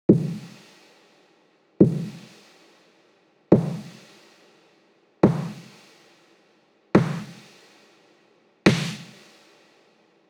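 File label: 140 BPM Intro Break Drums snare; Vengeance - Essential Clubsounds Vol.5; VEC5 140 BPM Vengeance Intro Kit Root E; Drums & Perc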